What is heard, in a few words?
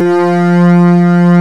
ANALOG; East West - Global Sounds; PPGWAVE22